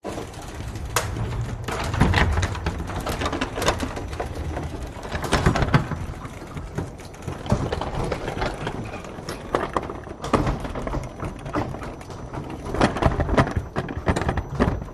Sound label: door electric roller roll wooden; zapsplat